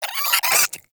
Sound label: Cryptilex Vocal Attack Short; Characters; Warframe; Hunting Critters